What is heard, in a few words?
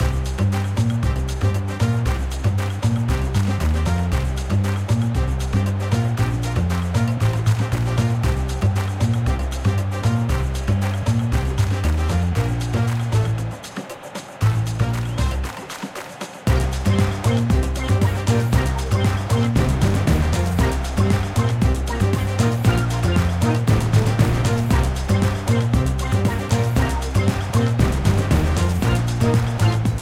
menu muzak; footbrawl